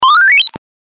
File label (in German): leben verlieren; snail against squirrel